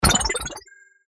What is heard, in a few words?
Whack, percussion; revealed percussion vol 2